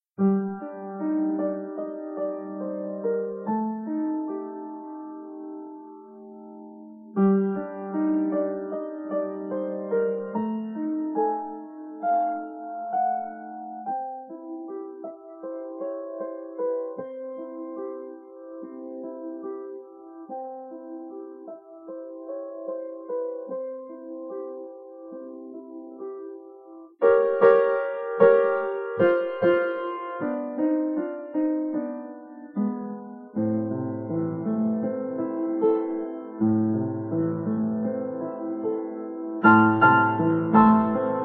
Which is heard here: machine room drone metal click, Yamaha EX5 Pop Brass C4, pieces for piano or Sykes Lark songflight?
pieces for piano